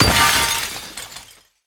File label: Crash Objects Glass; gamerip; Car Simulator 2; android